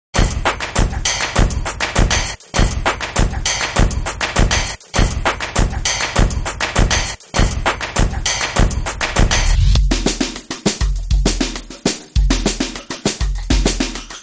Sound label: Drumland